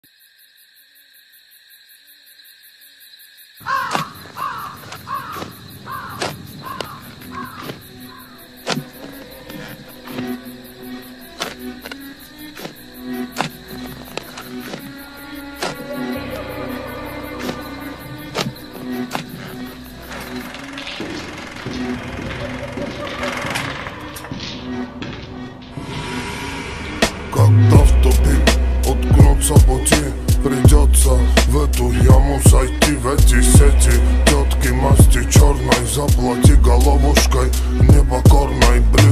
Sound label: slowed reverb